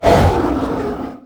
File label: Whoosh Small Evil; god awe full clicker 2018 gamerip